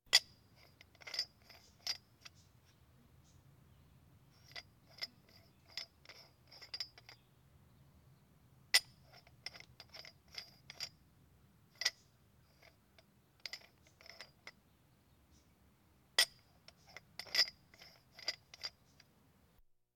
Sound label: Police, Fire engines